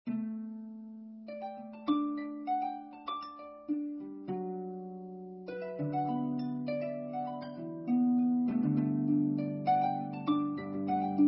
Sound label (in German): Harfe